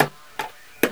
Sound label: drums